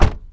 car

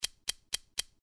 clock

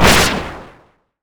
slash

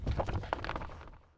book

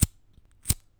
fire

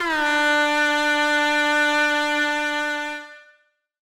whistle

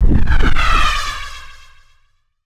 sonic

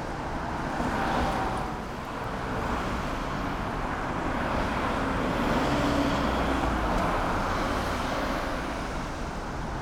traffic

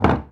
chair

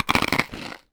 eat

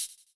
shoot

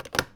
phone

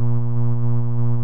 mecha

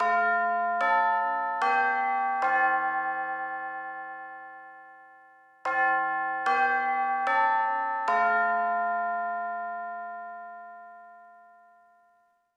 chime

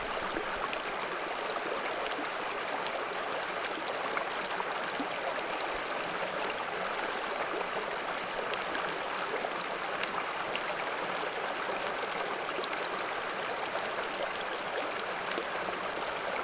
river

